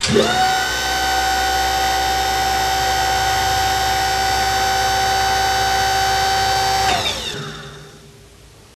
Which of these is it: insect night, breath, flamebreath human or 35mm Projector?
35mm Projector